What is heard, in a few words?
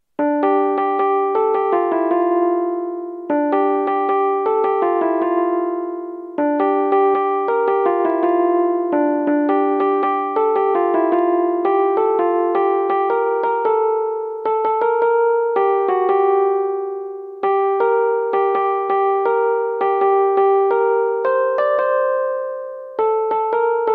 Instrumental Ringtone